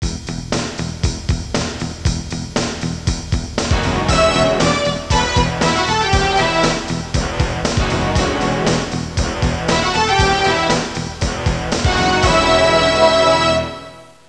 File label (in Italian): suonerie musica rock